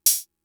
Open Hat, Drum Machines MFB-522; Fricke

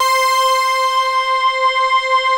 JUNO 106, PADS; Masterbits; Vintage Voltage

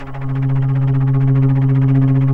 FLT, Bass Flute, Bs Flutter; Roland LCDP04 Orchestral Winds; 90 s Sample CDs

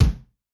Roomy, Kick, Yamaha DTXpress-II

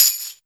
TAMBRIN, TAMBOURIN; Big Beat Samples & More; AKAI

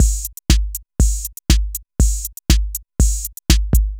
Synth Loop, BPM120

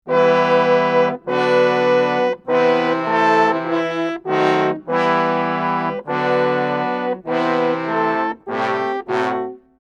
100 Emin all, Brass; 90 s Sample CDs; PowerFX - Downtown Orchestra

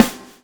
swing

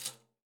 PANDEIRO

